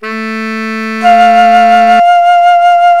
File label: harmonic test signal; harmonic test signal 1; Separation of Sound Sources by Convolutive